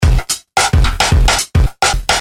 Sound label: drums